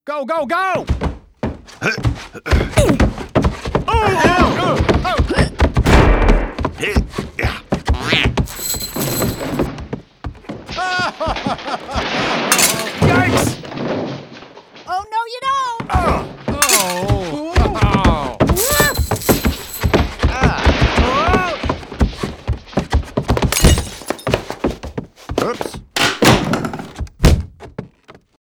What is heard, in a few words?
Toy sounds; Toy Story 3